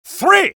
spy taunt exert; rps

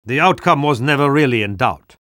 spy cheers

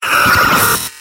scout pain sharp; vo; tf2; mvm

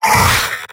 pain sharp; vo; sniper; tf2; mvm

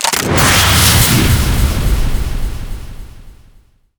powerup pickup supernova; Garry's Mod; tf2

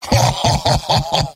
laugh evil; heavy; mvm

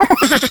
skelly small

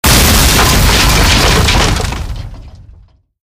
barrier smash; ambient; Garry's Mod; tf2; rottenburg

